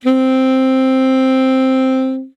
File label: sax alto C